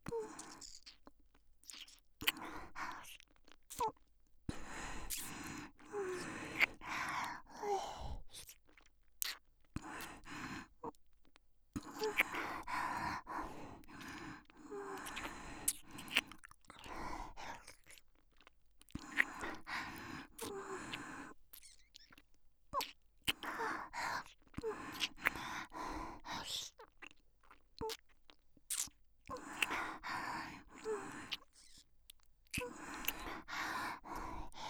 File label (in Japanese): ディープキス